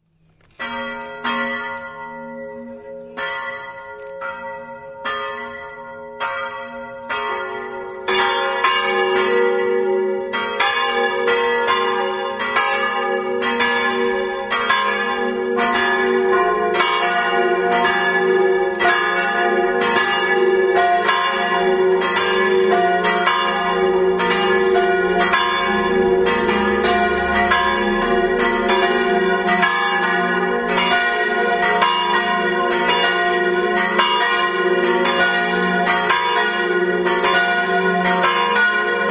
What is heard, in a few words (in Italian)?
campane; olegna